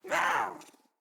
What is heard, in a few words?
Pet Speak; Catbrow; Pet Ship